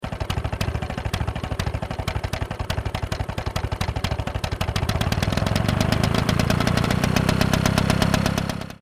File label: lawn mower